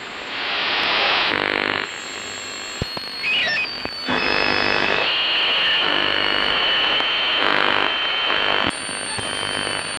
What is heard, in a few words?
create a trying to tune, analog